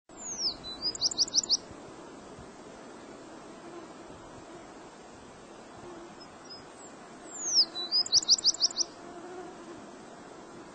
American Tree Sparrow; Birds

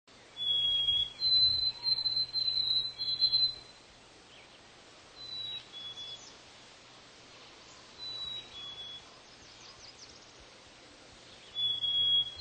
White-throated Sparrow; Birds

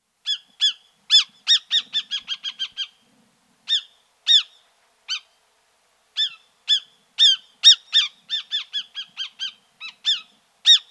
Northern Harrier; Birds

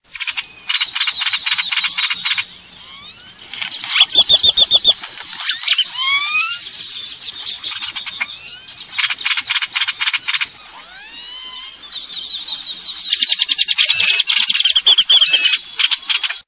Great-tailed Grackle; Birds

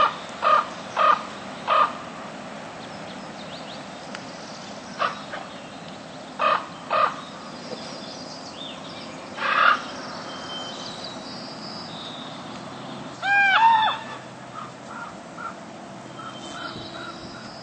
Common Raven; Birds

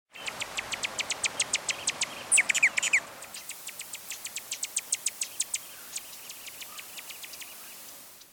Allen's Hummingbird; Birds

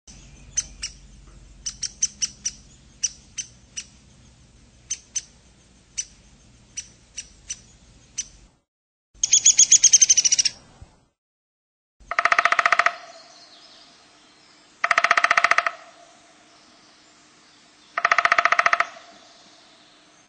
Downy Woodpecker, Birds

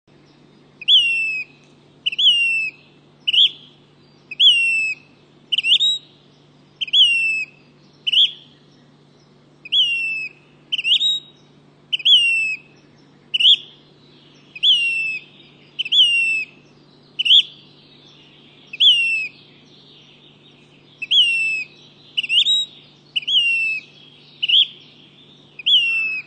Say's Phoebe, Birds

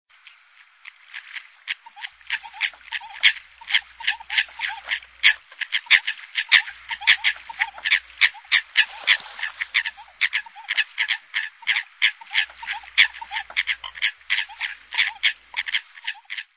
American Coot; Birds

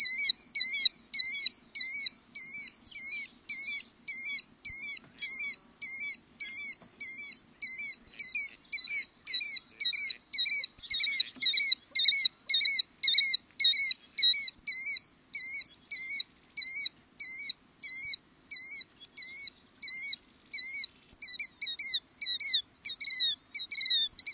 Killdeer; Birds

